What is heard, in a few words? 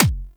Weapon Punch Pistol; Warframe; Weapons; Pistols